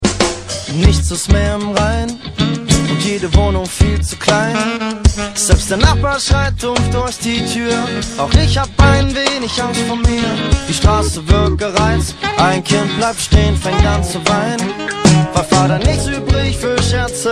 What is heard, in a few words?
Sax; Charts mit Sax Beispiel